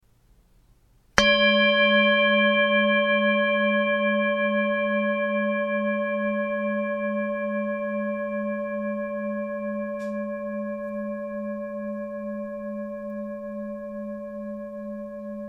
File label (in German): 760g Buddha, Klangschalen